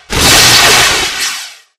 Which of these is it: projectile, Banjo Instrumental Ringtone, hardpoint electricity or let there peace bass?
hardpoint electricity